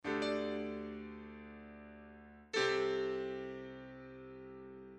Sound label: piano couplet